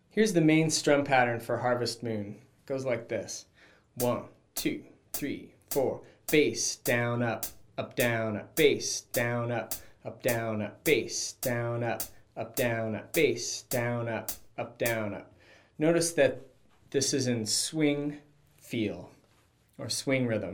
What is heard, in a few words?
Main Strum Pattern